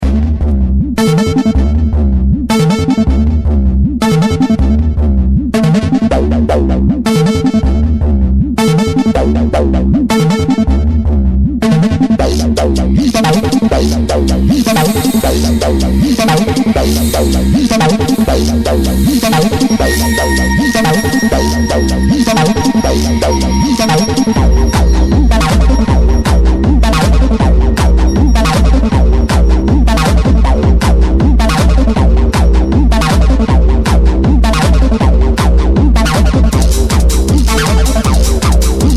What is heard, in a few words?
BassXpansion Von Unten